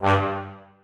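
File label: trombones staccato g2; Sonatina Symphonic Orchestra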